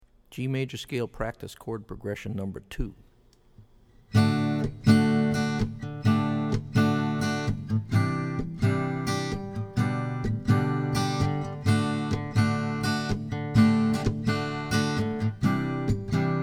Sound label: G Maj Scale Prctce Chrd Prog; lead skills materials